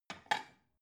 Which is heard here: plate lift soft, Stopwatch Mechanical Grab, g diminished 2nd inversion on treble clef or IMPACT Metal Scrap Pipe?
plate lift soft